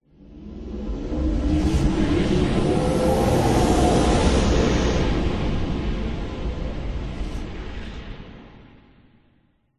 cruiser flyby animation particle swish swoosh accent logo sci fi futuristic; alliance; alien ufo; epic stock media